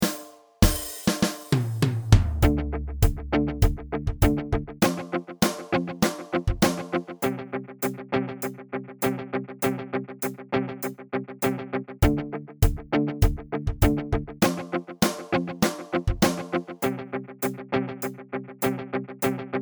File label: bucket beat slow; junk percussion